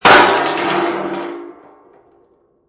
Metal Crash On Cement Heavy, Impact, Metal Crash On Cement, Large Heavy Metal Object Thrown Onto Cement Surface